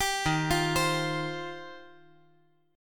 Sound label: D sharp 6th Add 9th